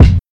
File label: studio steel kick drum sound F key; kick drum samples